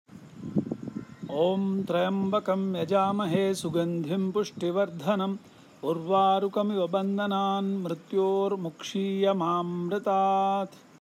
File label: Maha Mrityunjaya Mantra